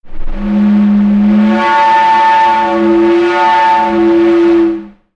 sound design effect air pipe pressure

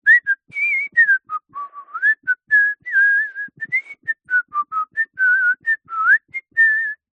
human whistle mouth short song; zapsplat

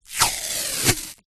band aid plaster strip peel from roll

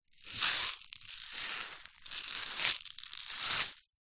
salt rock in plastic shaker tip movement; zapsplat

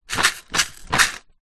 leisure game pocket travel shake; mastermind; zapsplat

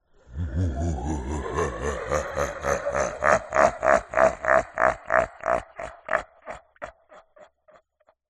horror male low pitched evil laughter

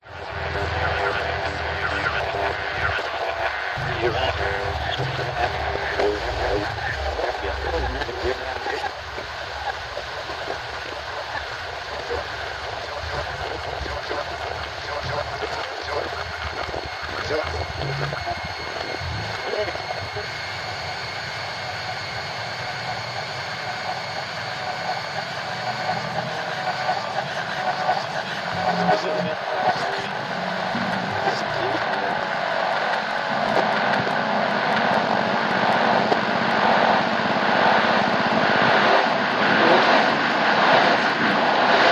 science fiction radio interference weird; zapsplat

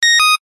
technology electronic device beep tone; zapsplat

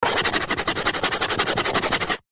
chalk stick thick scribble on small chalk board; office

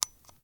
gun 1911; weapon; still north media

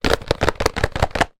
foley box card mobile phone packet shake; zapsplat

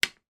household childs fishing net hit internal wall

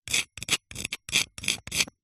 rock brick metal blunt scrapes writing engraving

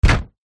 human body slam against surface

